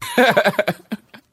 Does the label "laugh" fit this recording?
Yes